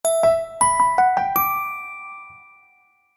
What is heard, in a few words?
cute call notification ringtone